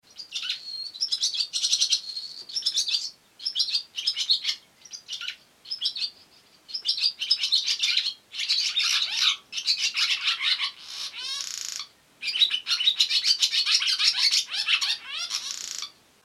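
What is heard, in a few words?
swallows; lastochki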